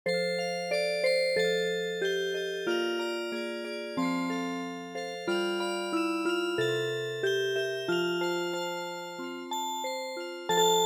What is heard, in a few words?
Music Box, traditional